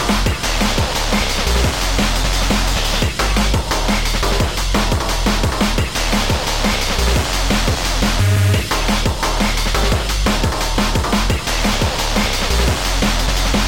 drum razor

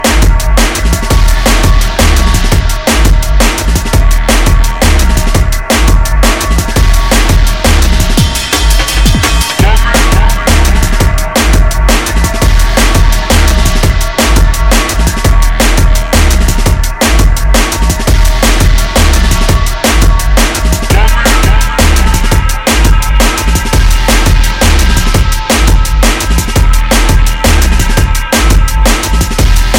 acid scale

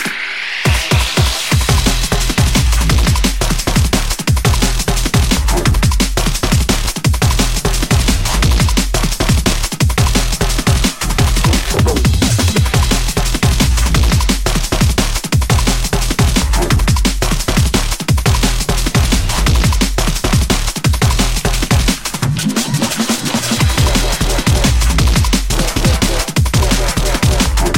hello horn

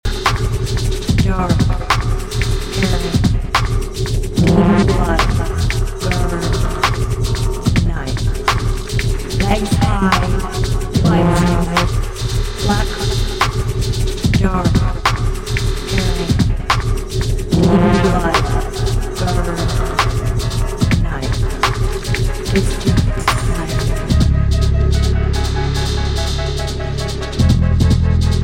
Bass

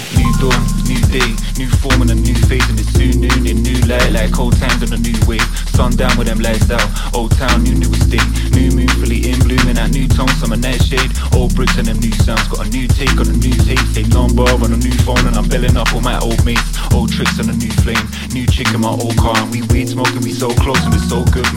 warm

